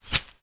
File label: foley glass broken in plastic bag single shake; zapsplat